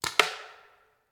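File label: Paintball Gun Impact; Sound Ideas; Guns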